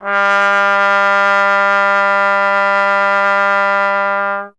Trombone G; Miroslav Vitous - Solo Instruments VOL-1

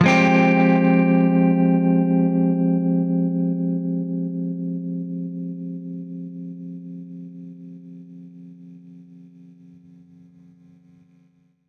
Baritone Chord Fmin[add9], Guitar Chords; undercover samples

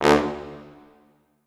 Orchestral Brass

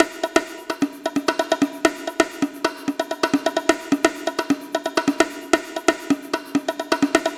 DRM, LOOPS 130, House

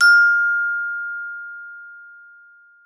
F4, GLOCKENSP S; Xsample VOL-4

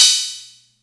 Atlantex Music Percussion Computer Cymbal Short

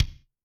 BD Rock, Yamaha DTXpress II Kick

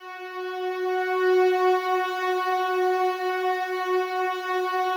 slow strings F#3, Yamaha VP-1; 90 s Sample CDs; Music Store

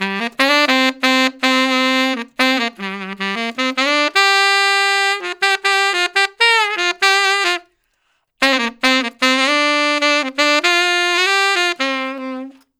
Tenor Solo, Jazz, Traditional, Tenor Sax

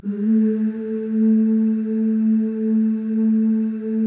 FEM OOS, Symphony of Voices; Spectrasonics; FEM.2 OOS

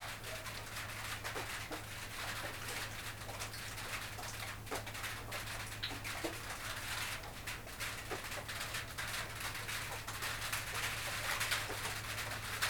South African Ambiences, Interiors; TheWorkRoom Audio Post

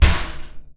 GENTLE, Anime Sound FX; COMIC

